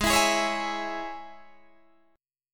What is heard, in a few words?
G sharp Suspended 4th Sharp 5th G sharp, Guitar DADGAD; 18,19,18,18,19,18